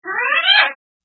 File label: Wink Siren